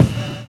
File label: live kick; Univers Sons - Kitch 70s